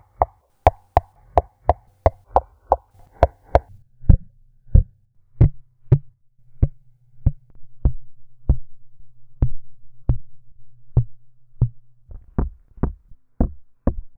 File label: small glass summary, Cup drum; russia 2011